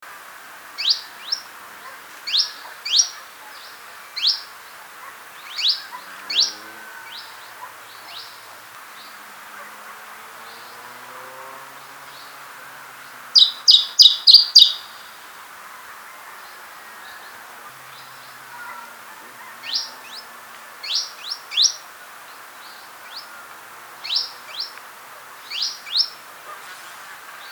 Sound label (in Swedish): Kanariegransangare; Phylloscopus can canariensis; Canary Islands Chiffchaff; Christoph Bock